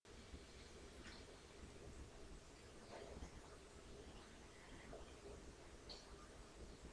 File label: cry